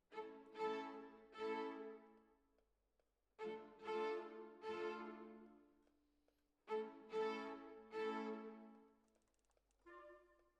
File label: Violins, jazz for piano